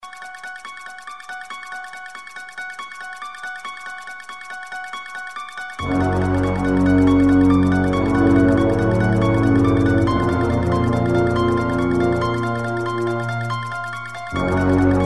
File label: ringtone; halloween theme